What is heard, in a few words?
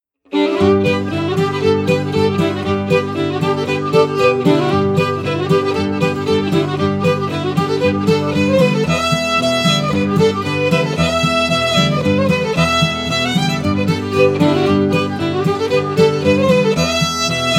Indian war whoop